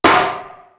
RATT DANCE; East West; Global Sounds

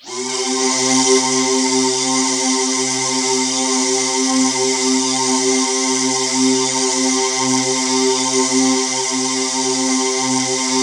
C1, Synth Line; 90 s Sample CDs; NiceBeats; SynthLine VOL-31

